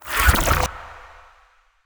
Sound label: Ice Spell Utility Unlocking; Utility Spells; Arctic Aura